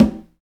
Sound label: PRC XCONGA, Skin Drums